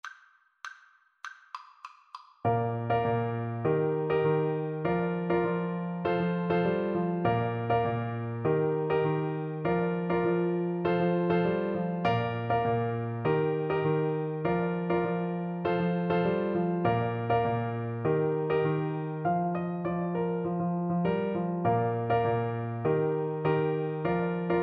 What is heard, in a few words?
township toon, flute